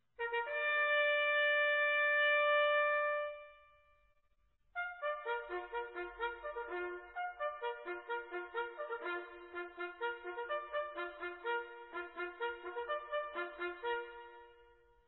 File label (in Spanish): tropa, corneta